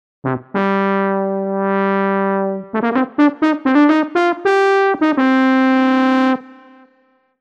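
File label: Brass Horn; TURBO VL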